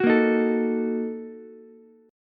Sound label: Bb Major 7th Suspended 4th Sharp 5th, Ukulele; strummed